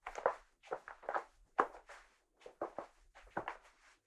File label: carpet walk, footsteps; NS Studios - ultra sound collection